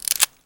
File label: magnum chamber out; weapons; 44magnum; garrysmod